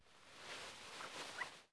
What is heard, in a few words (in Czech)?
utirani kratke; Mafie 1